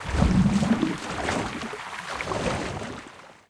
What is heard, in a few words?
Removeable Water